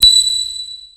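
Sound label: Battle Skill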